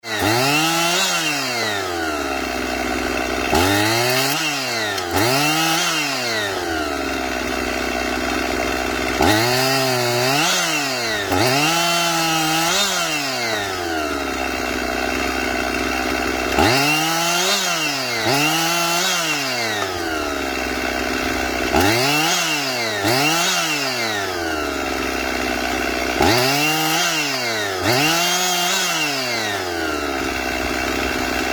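raspil breven benzopiloi, sawing logs with a chainsaw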